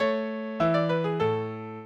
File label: minuet, mozart